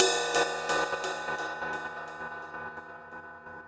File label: Gated Cymbal; musicradar